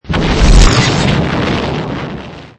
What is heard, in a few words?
supersplode